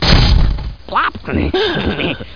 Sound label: air door